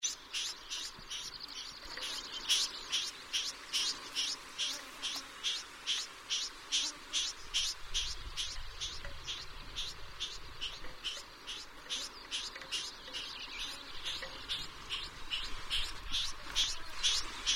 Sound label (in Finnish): Galste, Kahlaajat kurpat; Pura